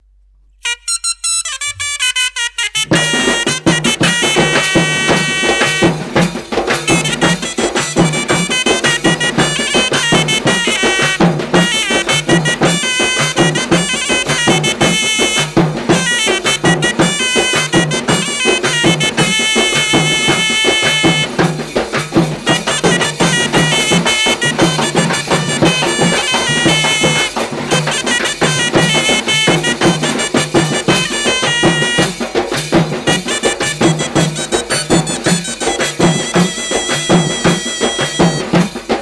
Band Musical Sequence; Attakathi (2012)

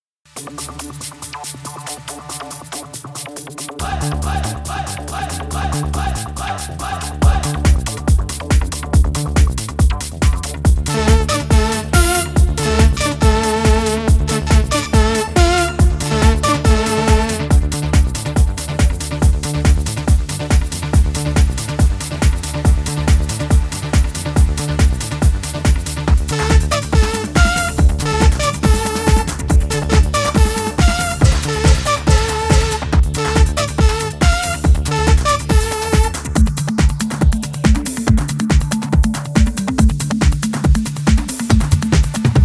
Theme Thunder (Trance); Oru Kanniyum Moonu Kalavaanikalum (2014)

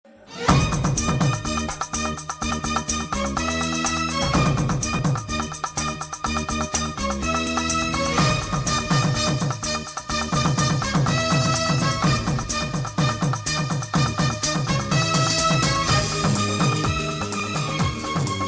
Instrumental; Padaiyappa

